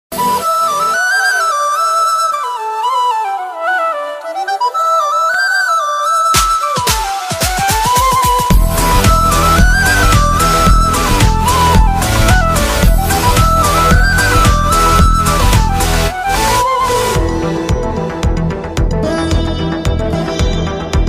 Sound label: bgm song ringtone bgm; red; rampotheneni; red movie